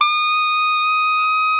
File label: TENOR MF D#6, Saxophones; Greytsounds; Philip Wolfe David Crozier's Saxophones